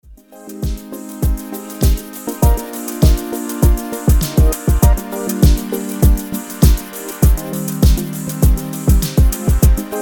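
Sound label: House instrumental 120BPM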